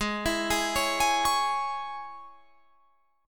G sharp Suspended 4th Sharp 5th G sharp, Guitar Drop D